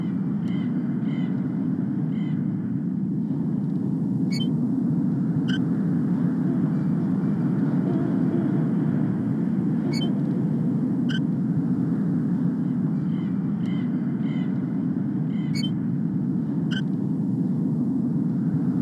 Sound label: Outside Ambiance